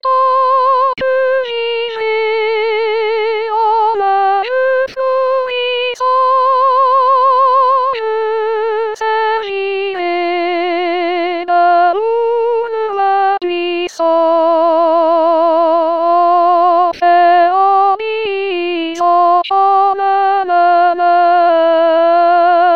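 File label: soprano; sermisy; tant que vivrai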